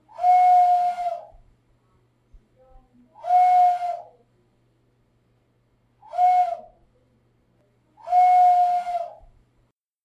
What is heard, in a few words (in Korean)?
검은 산 부엉